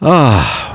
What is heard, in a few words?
sigh